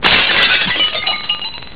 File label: break Sound